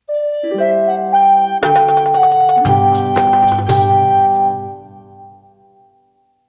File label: Stinger